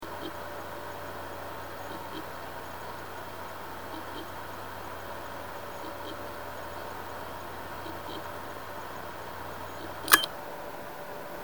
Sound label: hdd off